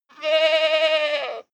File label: goat baa high male